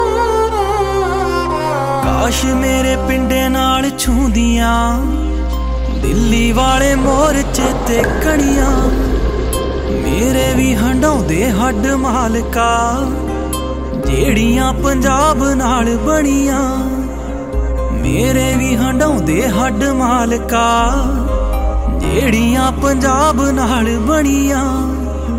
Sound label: latest punjabi song ringtone